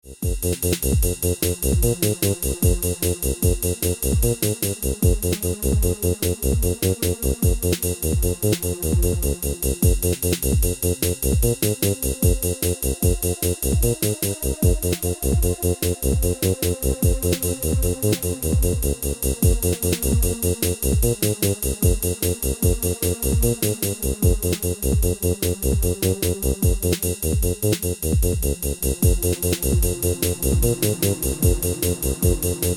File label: Paia Bass